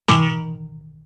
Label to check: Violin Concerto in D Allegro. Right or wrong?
Wrong